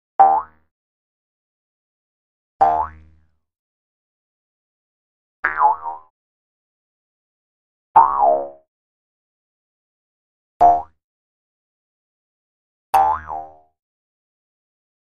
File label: Jews Harp - 9 Effects; Various Jews Harp Boings.